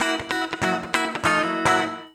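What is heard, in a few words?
guitar, Drum & Bass, 145 BPM; Ueberschall; Drum & Bass Resonance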